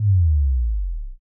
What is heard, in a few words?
sub drop